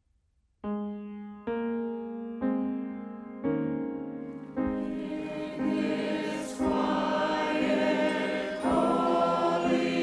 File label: Choir